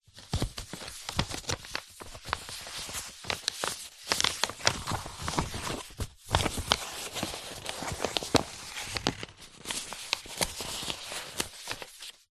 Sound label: paper x5 sheets a4 flat handling gentle rustle; foley; zapsplat